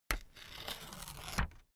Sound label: notepad sheet tear off